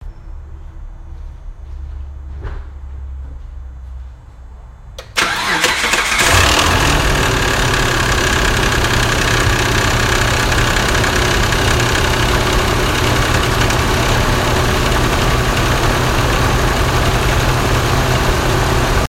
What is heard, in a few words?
cold start knock rattle, mitsubishi montero; montero sport